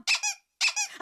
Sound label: squeak censor; baby looney tunes